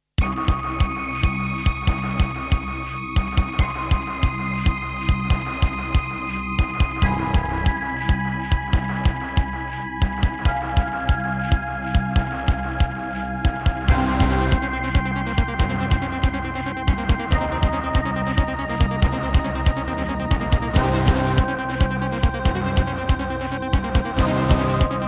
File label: Roland SCB-7; sigil doom episode 5